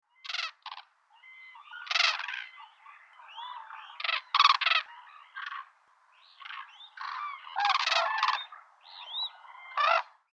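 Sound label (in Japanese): manazuru call, white-naped crane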